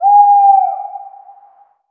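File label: bird distant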